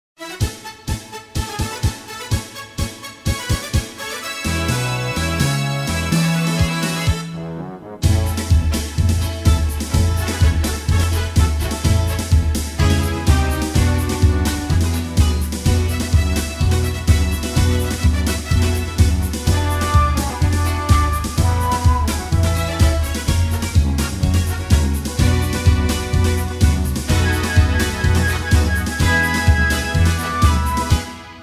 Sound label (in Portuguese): hino do sao paulo futebol clube instrumental; o mais querido